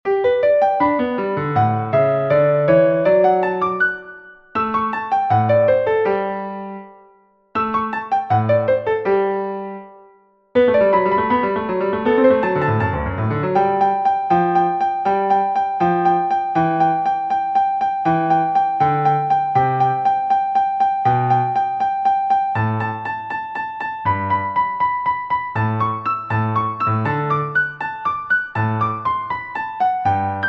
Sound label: score piano